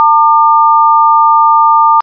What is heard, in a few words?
fake a, HUMAN VOICE